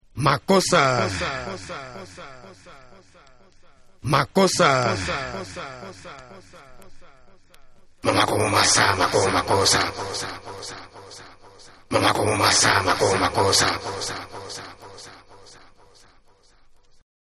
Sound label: Afro Acappella